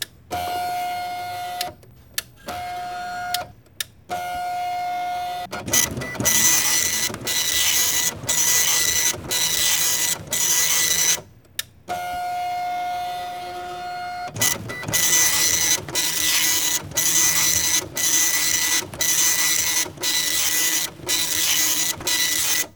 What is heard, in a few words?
Printer Dot Matrix; Home & Office